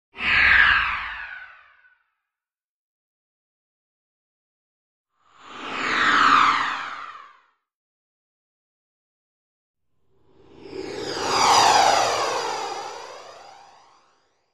Spaceship By, sci-fi space fx

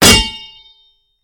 Sword Clanks Heavy Si; swords

